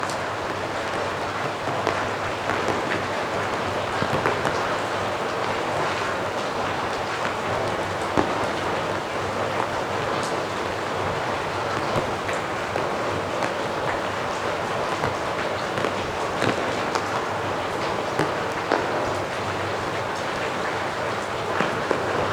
Rain Drop; Splash

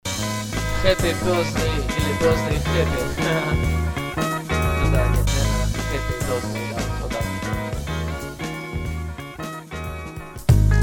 HappyBirthday

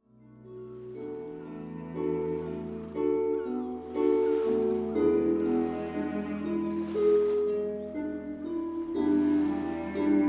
Sleep Songs with Nature Sounds

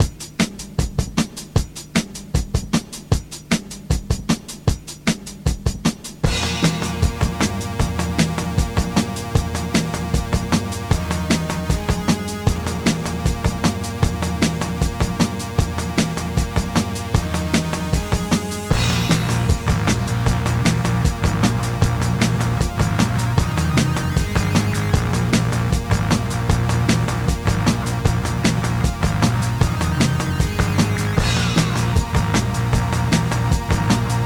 Chemical Beat

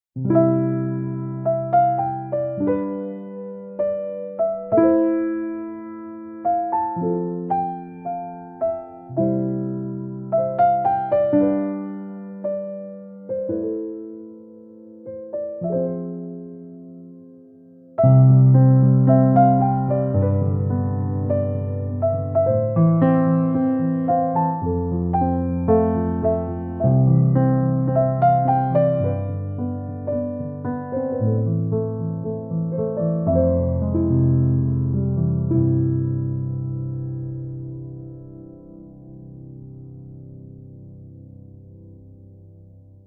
Piano Interlude; Romantic Piano Music

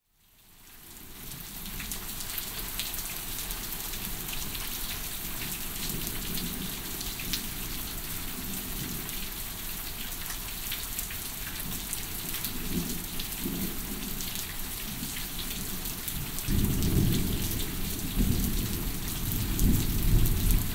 Rain Sounds Distant Thunder; Natural Spirit

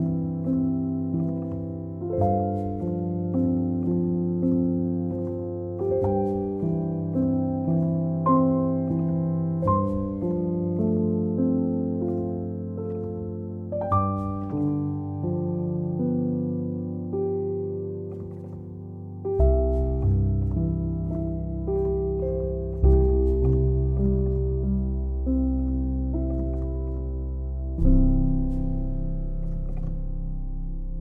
Piano

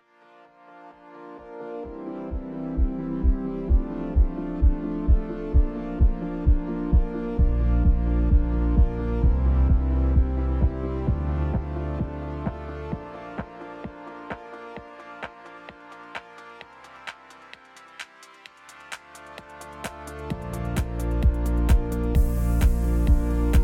Beat Soul

